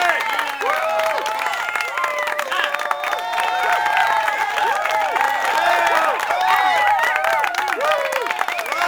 foule light, Scenery; Decors

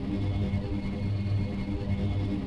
Engine Drone; machines mechanical

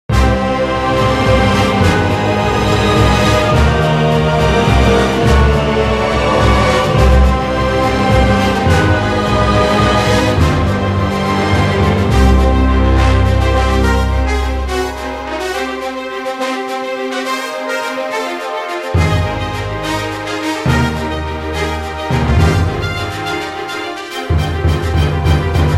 Deep Space; Superman The Man Of Steel; XBOX

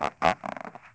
bench, church; AMBIENT; Pirates Of The Caribbean

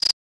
cricket; Tony Hawk's Project 8; XBOX; inschool

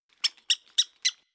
Prarie Dog; Animals; XBOX; BassProShopsTrophyHunter2007

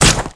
Bullet Body, Impacts

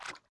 fs mud, footsteps

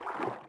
footsteps water

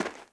footstep walk dirt

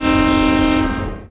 train horn

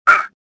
gasp; worker2

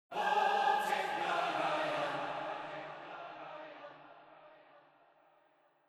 MUSIC

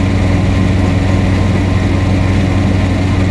Filter Hum; Finding Nemo; XBOX

